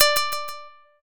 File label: shamisen